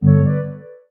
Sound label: Fleuron, notifications; Amazon Kindle Fire 2nd Gen